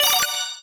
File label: Block Combo; Ui Block Combo5